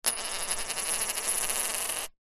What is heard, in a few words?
money coin australian 10 cent set down and spin on tiled table; foley